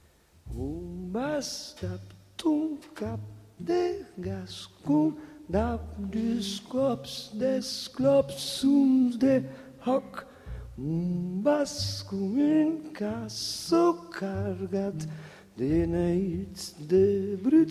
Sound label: canto